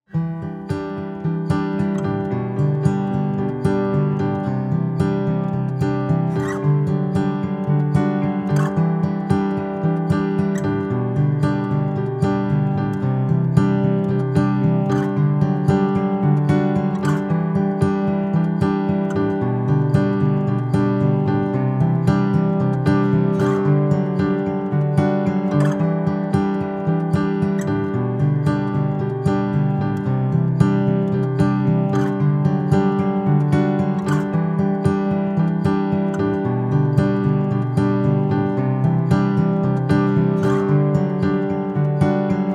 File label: situations acoustic instr